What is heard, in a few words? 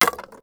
bones; skyrim